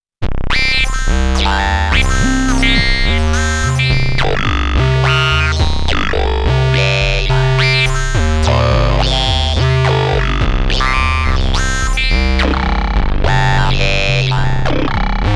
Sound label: Dreadbox Polyend Medusa; A Yeah